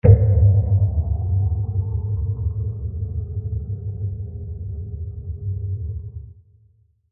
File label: Low Swelling Impact; Low Impacts; Sound Effects:Impacts; CraftedSignals